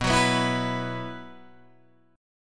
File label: B Suspended 4th, Guitar, Open G